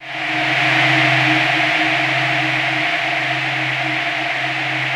DEEP PAD C4 R, Yamaha VP-1; Music Store; 90's Sample CDs

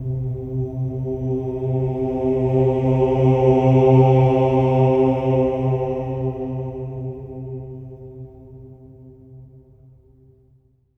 OH C3, Symphony of Voices, SWELL OHS; Spectrasonics

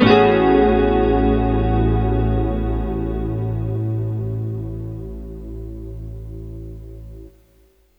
pad; Sonic Foundry (Sony Creative Software); Ugly Remnants VOL-2

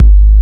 HELLA BOOM, 12-Bit Drums; E-MU Formula 4000 Series Vol. 1; Hip Hop Nation

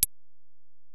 EPerc, Alesis DM-PRO; 300 Drum Machines

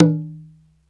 bata

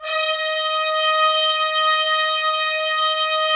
Trumpets; Roland - LA Composer vol. 4

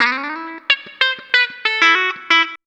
COUNTRY

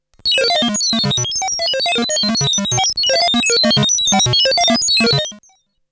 Melody oneshot; ZERO G - Datafile DISC 2

